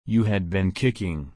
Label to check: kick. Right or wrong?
Right